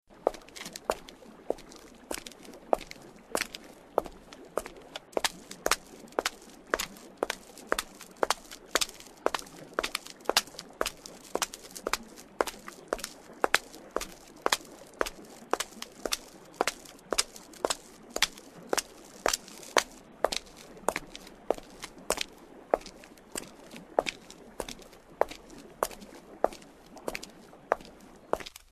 les pietons

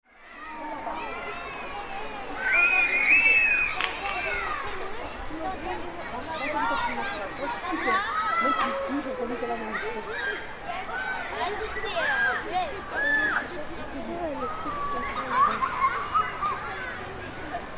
cote parc proche des jeux

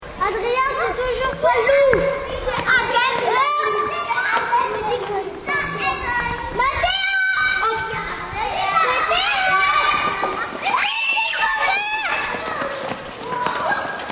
la recreation des petits

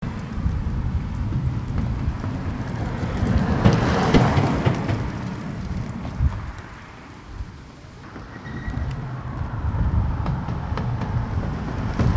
sur la passerelle

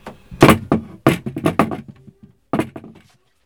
L échelle

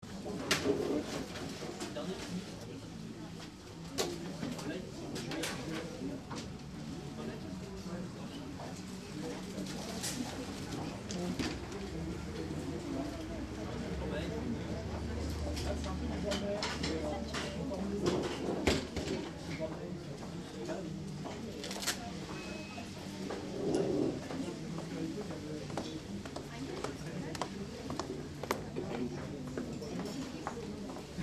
Au Mac Do

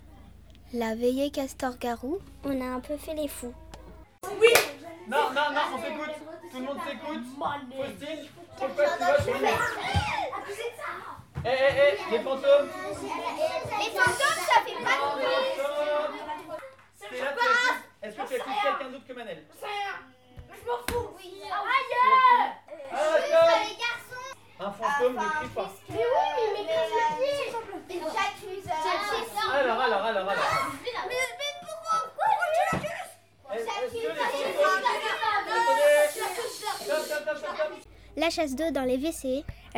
nuit peniche

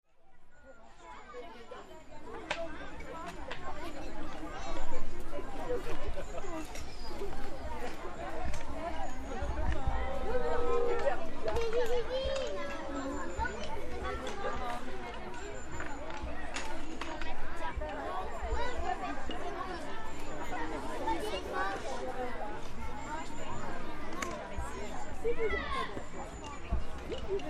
Ambiance

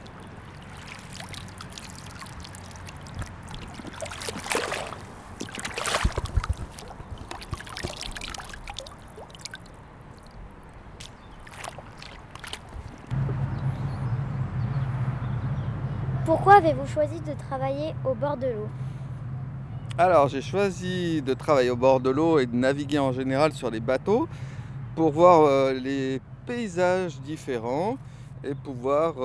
groupe eau; sainte-foy

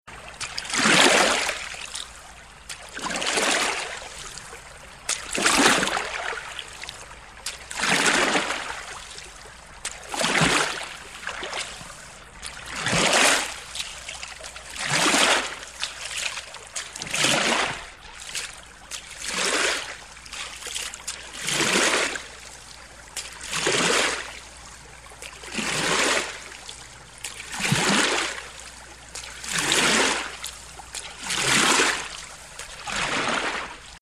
bateau rame

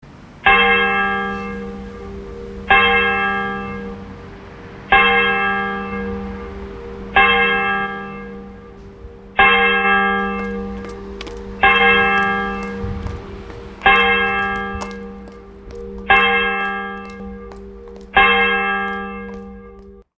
les cloches de Fourviere

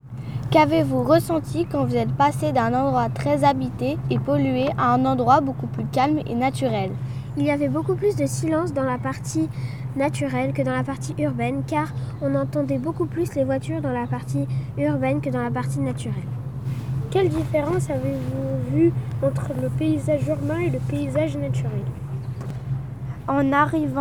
paysages; sainte-foy

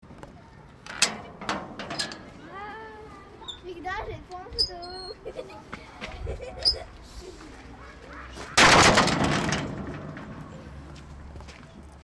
le portail qui claque

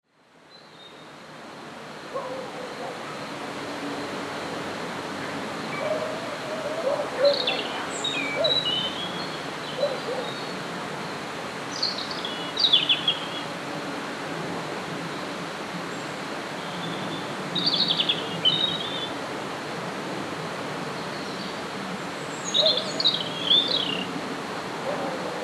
rouge gorge

